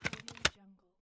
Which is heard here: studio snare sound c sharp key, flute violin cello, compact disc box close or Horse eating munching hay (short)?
compact disc box close